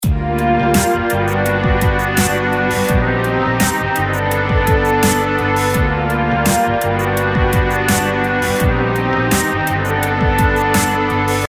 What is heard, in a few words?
filter effect